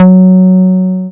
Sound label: Dance Floor Bass, Multisample Basses